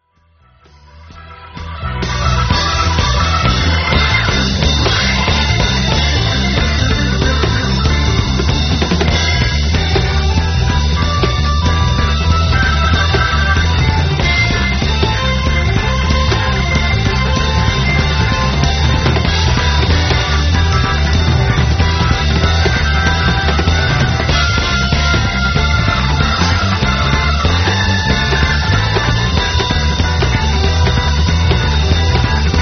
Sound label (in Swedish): orgelsolo